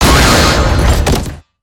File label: Cars crash hit ground